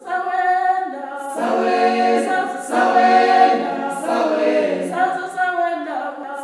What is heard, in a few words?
SWAY CHR, FEM CHOIR; Spectrasonics; Heart of Africa 2